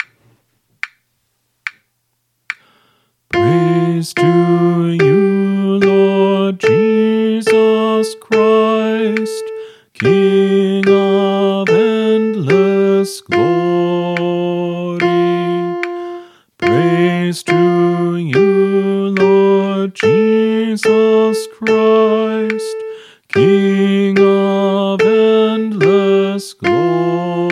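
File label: Gospel Acclamation, Choir Practice Tracks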